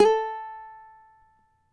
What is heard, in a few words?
CAVAQUINHO SLIDE, Guitars; Spectrasonics; Hans Zimmer Guitars VOL-2